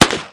carbine shot

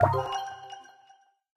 msg err

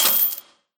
cash

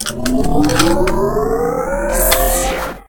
asmd reload

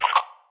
Chat Send

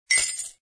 medium win; ThreeHeadedDragon

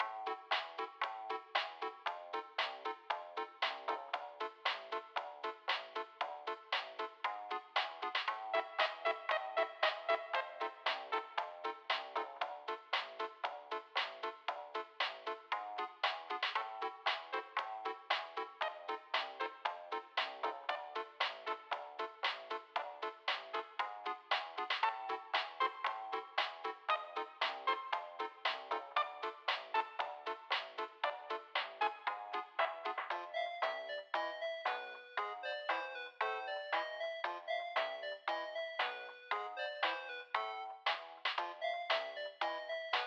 Main Theme Radio Version LOOP; Death by AI

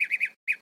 bird

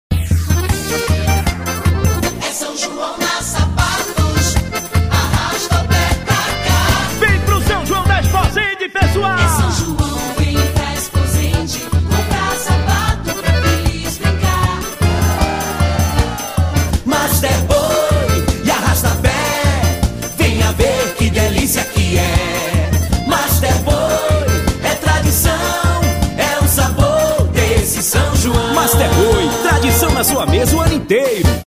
Jingles

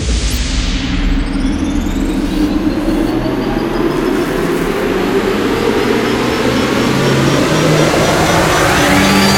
near win; berry burst

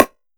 normal hit normal; argon

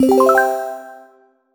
win

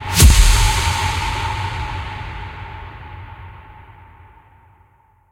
big Win Start; berry burst

